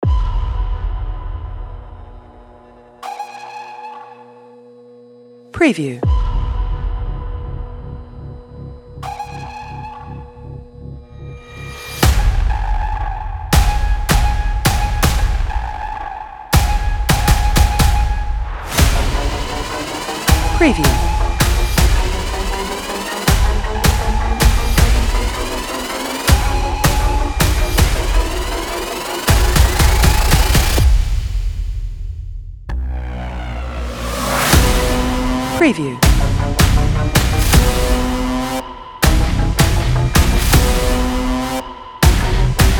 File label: Hybrid Action Trailer Thrilling Rising Exciting Countdown Intro